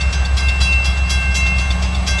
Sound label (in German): US Glocke